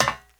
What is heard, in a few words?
step